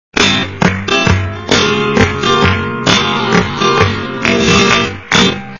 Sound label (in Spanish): buleria SLOW